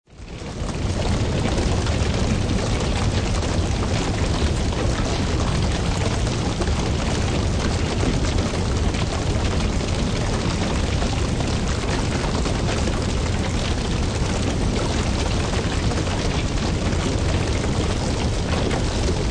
Fire Flames Heavy Crackle, An intense fire rages with strong flames, heavy crackling.; Burning house or other large structure; Lynne Music